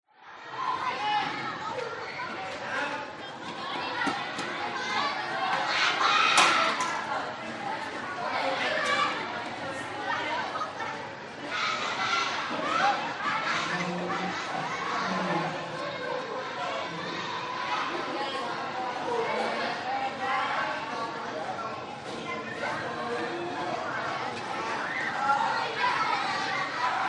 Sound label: public places school playground busy kids children walla vietnam